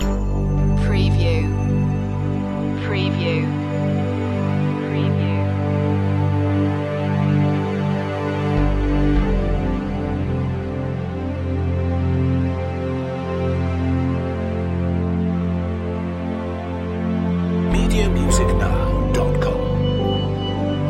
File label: perfect bliss loop